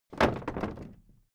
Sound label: object case wood old drop